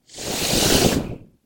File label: slash attack